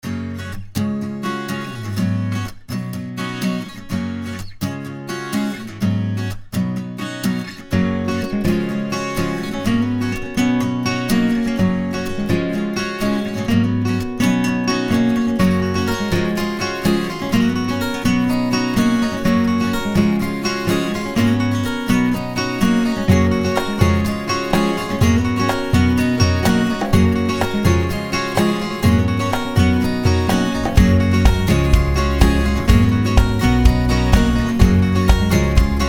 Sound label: folk hold music